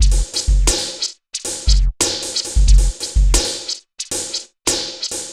Synth Loop, BPM 90

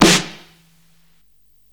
Snare, Psychedelic Drums

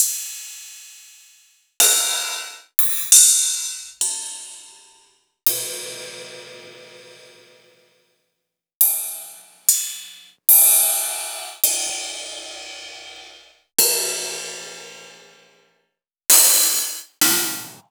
Rides

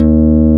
NYLON D#2, BASSES

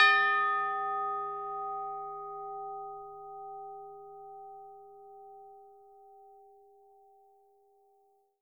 tubular bells hit D#5; AO bell

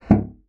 bassoon slap; Xsample VOL-6; C BASSOON

